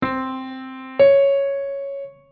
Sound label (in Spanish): octava aumentada ascendente; intervalos; musica; melodia; octavas